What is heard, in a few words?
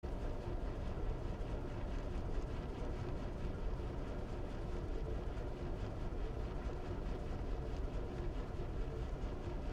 container at 10.6 knots